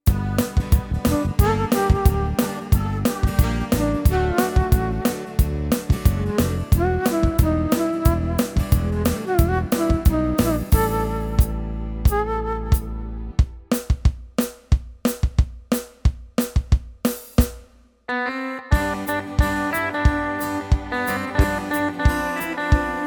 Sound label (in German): Testaufnahme Yamaha Style